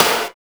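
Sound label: JAMMIN SNARE; Drums In Your FACE!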